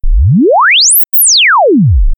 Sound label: Sine Log Sweep Up Down